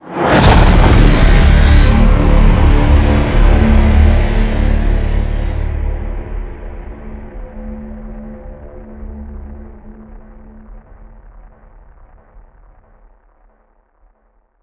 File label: Countdown Match Start; Korps; Modern Combat Versus